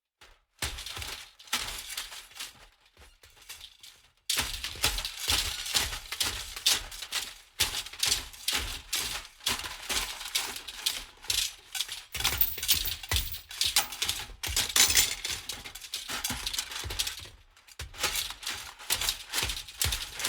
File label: metal rattling rhythmically